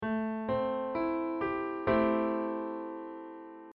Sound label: Am7 Chord